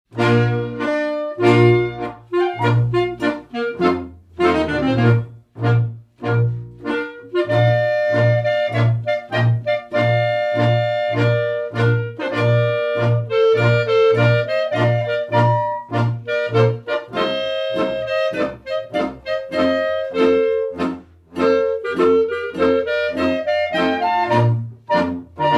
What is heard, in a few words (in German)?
Schuetzenmarsch; Balladen Moritaten